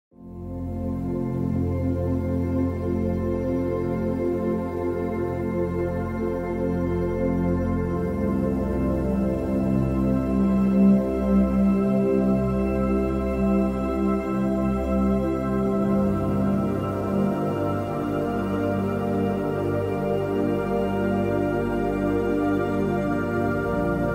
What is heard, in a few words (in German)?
852 HZ Erwachen; spirituelles